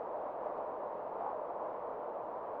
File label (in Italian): vento; Bubble blow